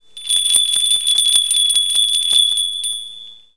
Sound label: xmas bell; holiday